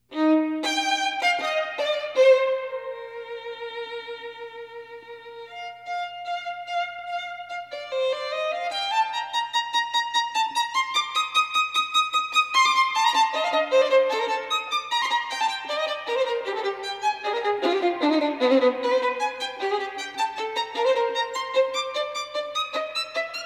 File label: Symphony No. 2 in D major Op. 73 1st movement, violin; from measure 44; Excerpts from violin part; orchestral excerpts